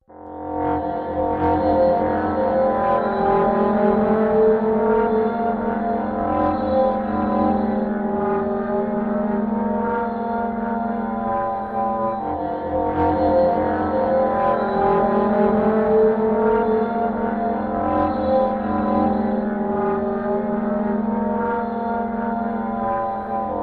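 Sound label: Drone Buzzing Distorted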